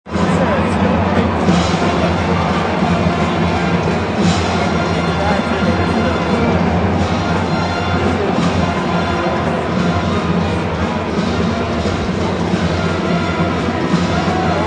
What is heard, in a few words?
Arena crowd makes noise as marching band plays; Arena Crowd Noise with Band; Arena Sound Effects; Sound Effects:Backgrounds